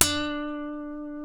E, funk guitars

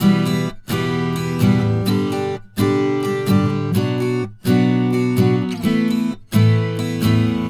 Acoustic Latin Guitar 128BPM Emin; Vengeance - Essential Clubsounds Vol.4; Guitarloops

